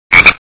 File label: frogg